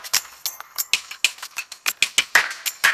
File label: funny